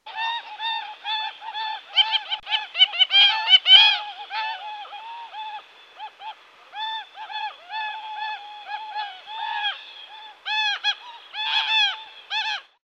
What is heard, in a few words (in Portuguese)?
jacana; fauna